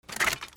HOUSE

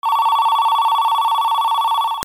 PHONES

